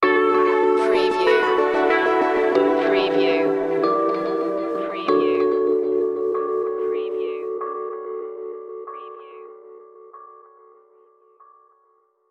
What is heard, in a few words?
hop to da beat sting